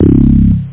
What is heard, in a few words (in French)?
BASSE; Scream Tracker Sample; 8,000 sample rate